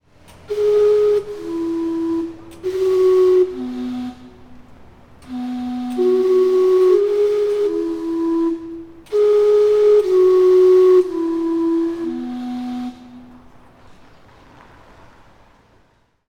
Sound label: Gastown steam clock